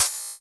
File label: Ride, Cymbals; Vengeance Samples